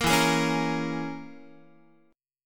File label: E 6th Flat 5th E down Guitar; E6b5; strummed; 13,12,11,11,14,x